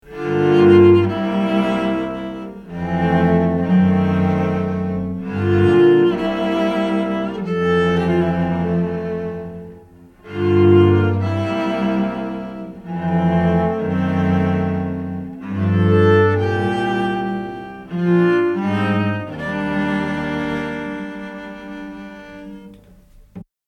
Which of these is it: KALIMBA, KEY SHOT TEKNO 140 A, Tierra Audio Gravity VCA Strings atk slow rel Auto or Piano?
Tierra Audio Gravity VCA Strings atk slow rel Auto